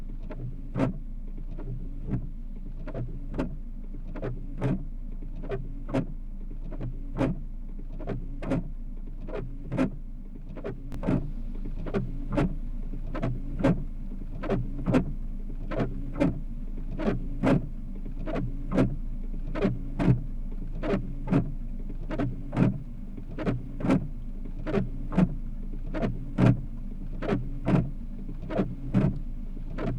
Windshield wipers

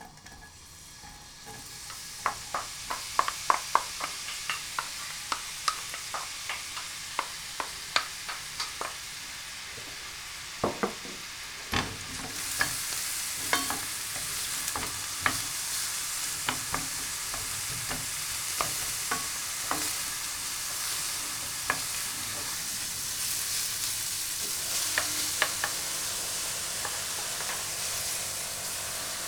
Frying onions

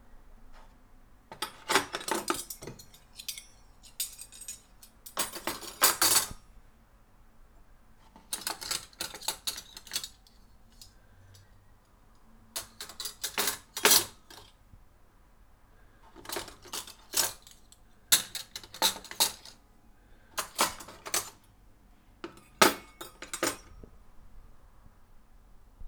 Cutlery

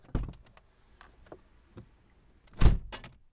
Opening and closing car door

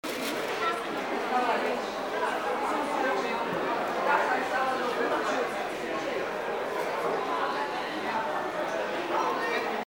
Market interior

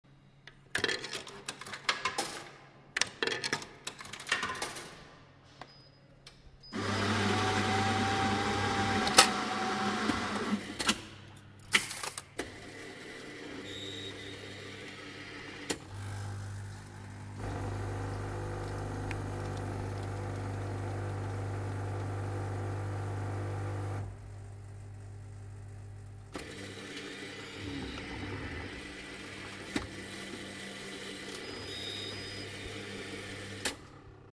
Coffee Vending Machine

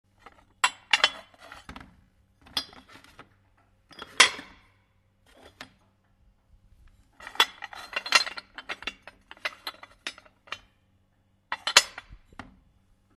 Tea cups

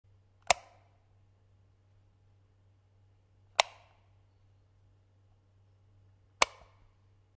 lights on and off

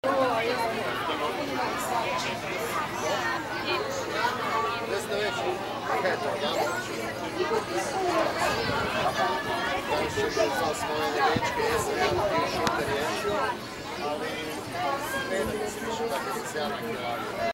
People in the park

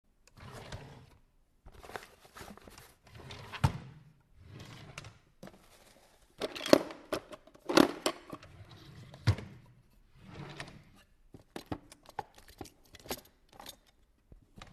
Office drawers

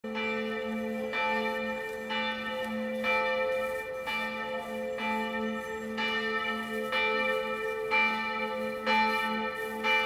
Church bell

